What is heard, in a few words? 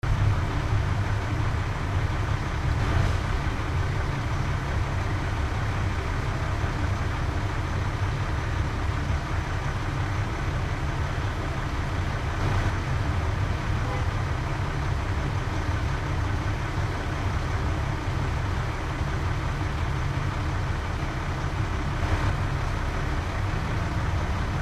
delivery truck idling; huntington beach